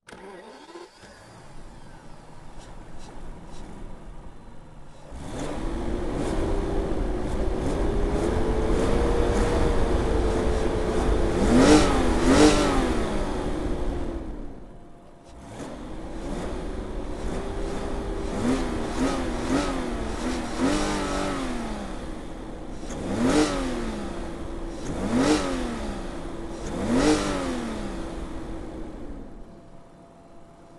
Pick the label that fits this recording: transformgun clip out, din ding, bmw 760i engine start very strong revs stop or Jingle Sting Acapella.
bmw 760i engine start very strong revs stop